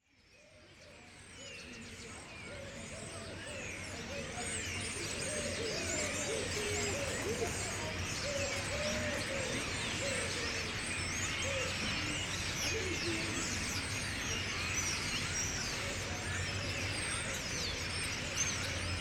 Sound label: flocks of birds; Flocks of birds, Paris.